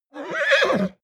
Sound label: horse vocal neigh